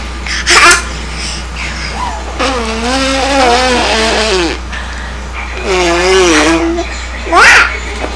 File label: blowing Rhasberries